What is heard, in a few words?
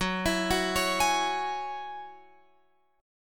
F sharp 7th Suspended 2nd, Guitar Open F; F#7sus2 arpeggiated